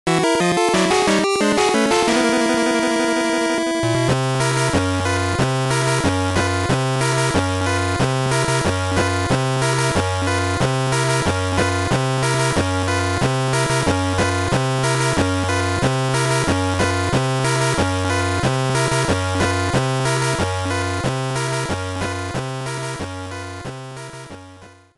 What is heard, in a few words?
Sink In! Double Bogey or Worse!; Mario Golf; GBC